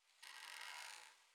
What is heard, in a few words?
wood strain, Night; FontainebleauDemo